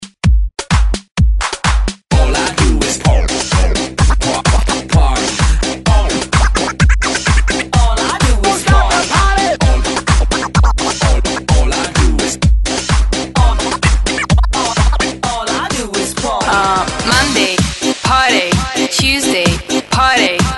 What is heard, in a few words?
HYPE REMIX